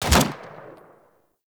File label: Fire Shot; Warframe; Weapons; Braton MK One Redux